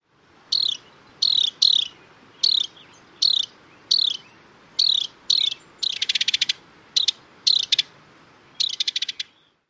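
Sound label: other calls, house wren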